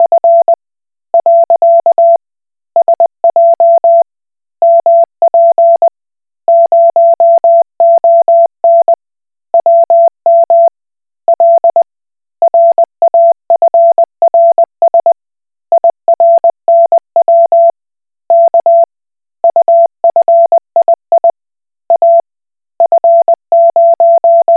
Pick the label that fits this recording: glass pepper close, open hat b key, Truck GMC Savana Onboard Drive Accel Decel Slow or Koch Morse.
Koch Morse